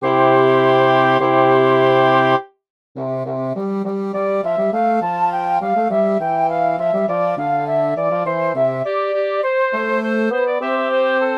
for wind quintet; bach johann sebastian; chorus siehe es hat uberwunden der lowe

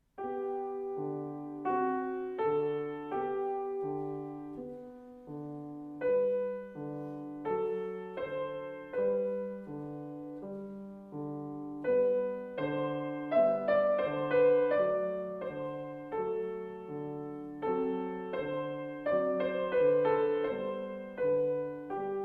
lullaby berceuse wiegenlied cradle song; oesten theodore

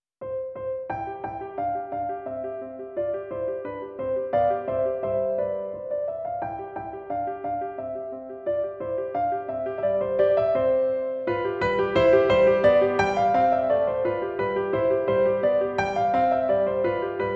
classical piano solo pieces

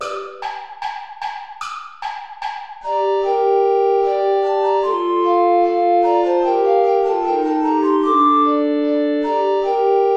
play along without alto recorder; praetorius michael bourra

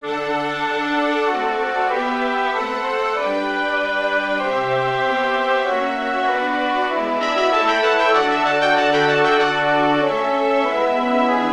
chorus for winds strings; bach johann sebastian; la uns das jahr vollbringen

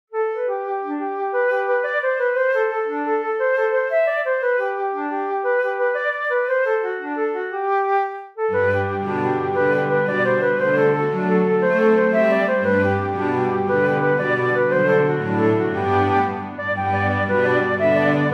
jig; the blackbird

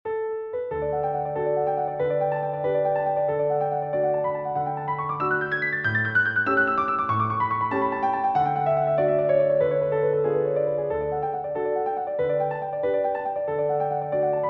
the spinning wheel, piano; burgma ller johann friedrich franz

